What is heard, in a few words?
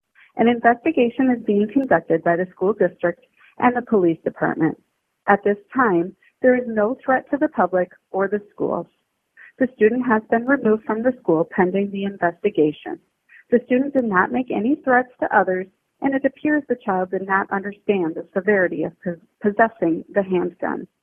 gun; Watertown